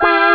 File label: chord; gremlins; ST-XX Amiga Soundtracker Sample Packs